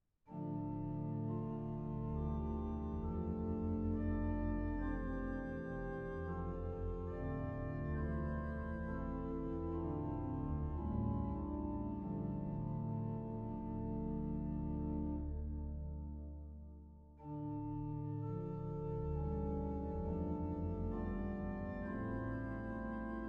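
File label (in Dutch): gezang, organ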